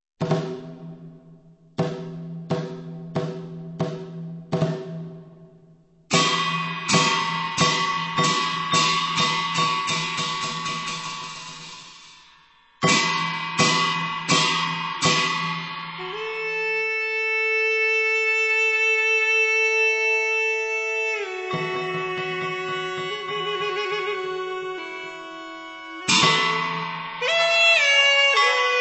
Music Guanzi; World Sounds

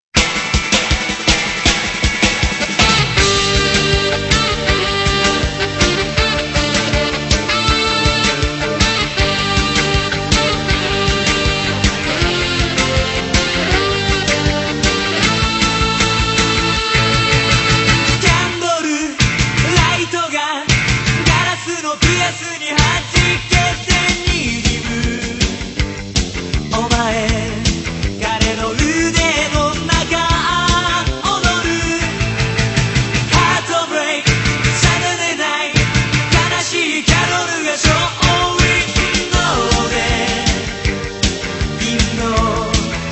Japanese Pops

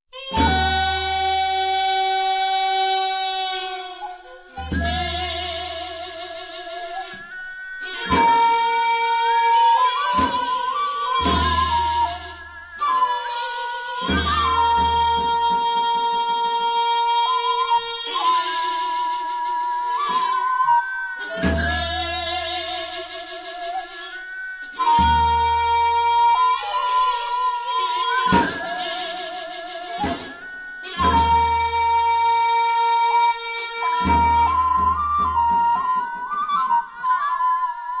Folkloric Traditions; World Sounds

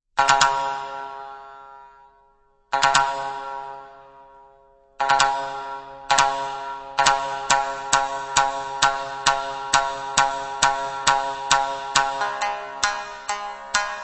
Shamisen

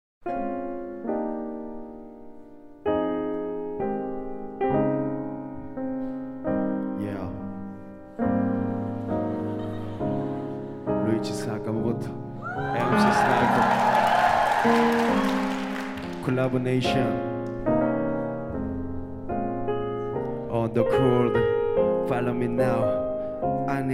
playing the piano

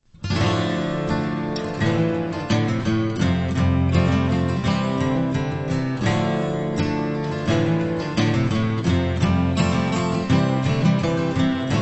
Acoustic Guitar

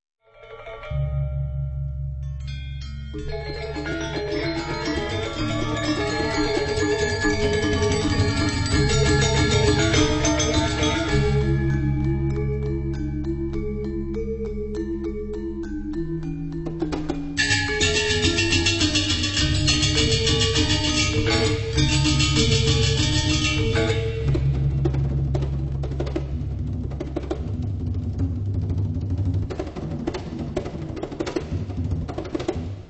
World Sounds Gamelan Kebyar

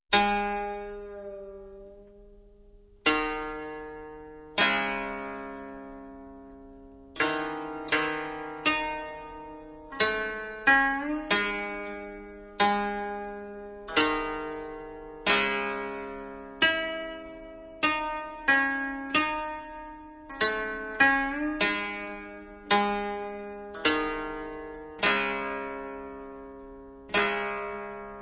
koto; World Sounds

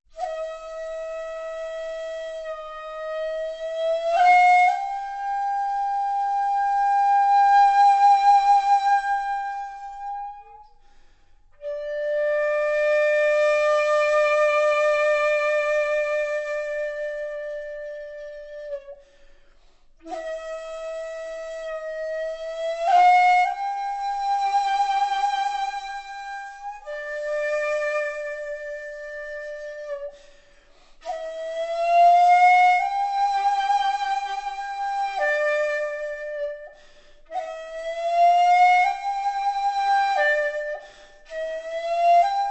shakuhachi; World Sounds

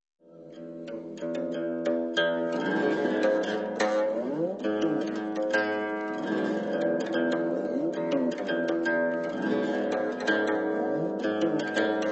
Music Qin; World Sounds

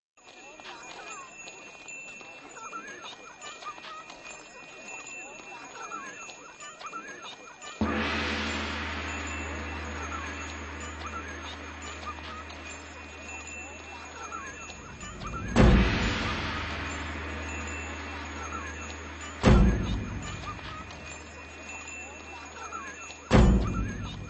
Heartbeat

